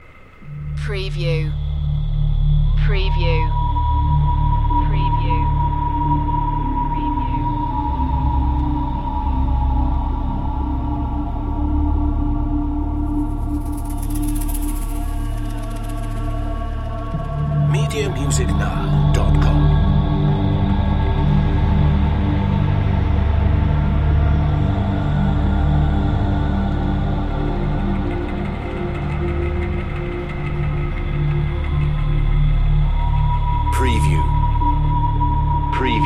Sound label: ghost walk